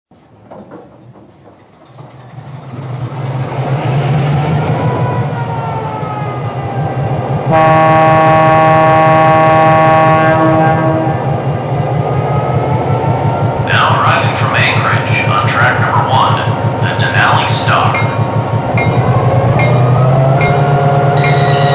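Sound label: EMD F-3, locomotive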